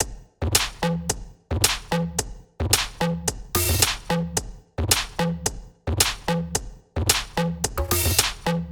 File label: 110 Slow House Glitchy, Percussion; Loops; Touch Loops; CosmicFunk